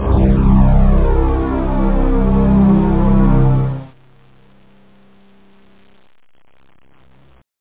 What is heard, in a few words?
synth string suspence; OctaMED SoundStudio V1